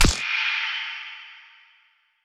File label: dark flute